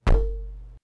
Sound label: HIT; Crash Bandicoot The Wrath Of Cortex; XBOX